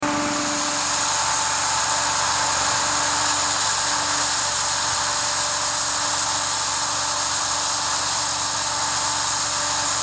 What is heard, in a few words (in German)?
Schneekanone